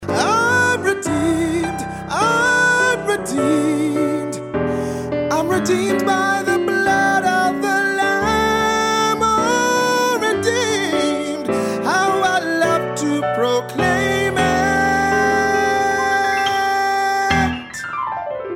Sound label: ring tone